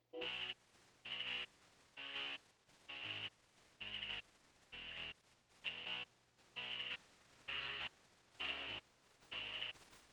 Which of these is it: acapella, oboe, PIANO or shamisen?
acapella